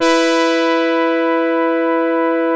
QUINTHORN E4, HARD BRASS; Music Store - Claudius Diemeter Schwerlos

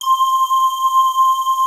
C5 R, LEAD; Music Store - Claudius Diemeter Schwerlos

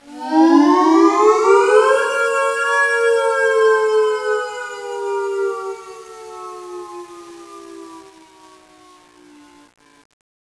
Vintage FX; Hip-Hop Street Beats Construction Kit; TwistedReality

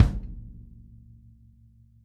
L KICK 2 F 2 R, Interactive Drum Kits; Sonic Reality

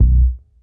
IN HOUSE C1, bass; Sampleheads; Ultra Freakin' Bass; IN HOUSEBASS

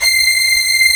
STR SEC C6; STR SECT #1; Strings

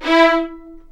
E4, VLNS ARCO; East West - The Ultimate String Collection

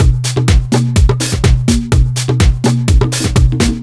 Drum Loops, 125 BPM; Sonic Foundry (Sony Creative Software); Underground UK House & Electro

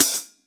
Drums Acoustic, RAW KIT; AKAI; 90s sample CD; USB Soundscan vol.10; RAW KIT 3

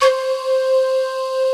C4 R, LEAD; Music Store - Claudius Diemeter Schwerlos

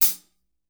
hat, drum; 90s sample CD

